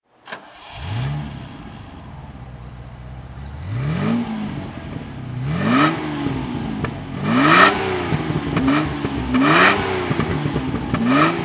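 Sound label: jaguar etype v12 OTS throttle, Engine sound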